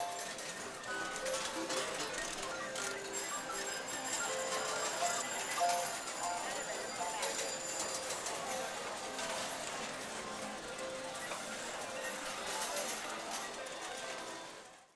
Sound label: Slot Zone